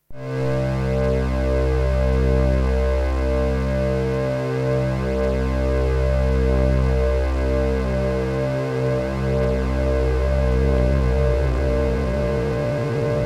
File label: lfo phase; arduino